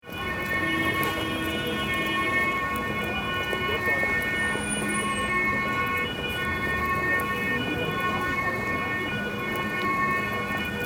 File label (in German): Polizei in city